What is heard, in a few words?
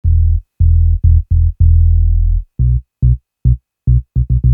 low dub reggae, basslines; esoteric